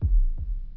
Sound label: heartbeat